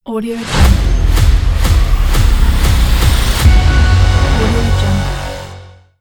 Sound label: cinematic powerful trailer logo